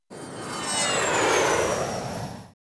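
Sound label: airplane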